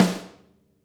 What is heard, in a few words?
SNARE, Interactive Drum Kits; Sonic Reality; ID SNARE 1+